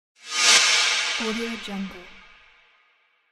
cinematic swoop

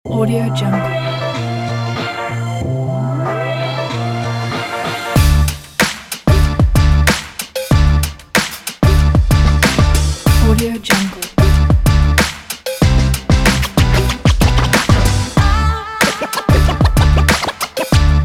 upbeat positive vlog hip hop

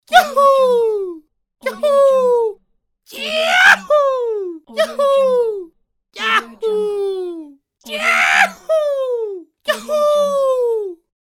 yahoo

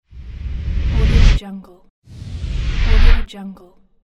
zoom

